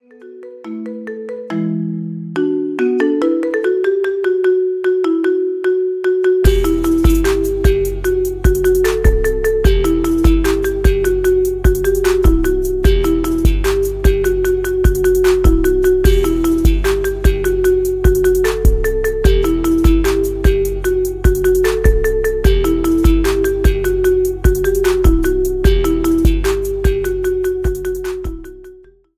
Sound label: iphone ringtone remix, Marimba Remix